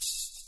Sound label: Shaker